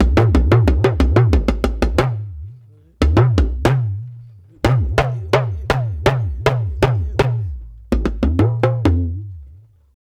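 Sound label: 120 bpm, Ju-Ju Talking Drum Solo; Spectrasonics; Supreme Beats; African Contemporary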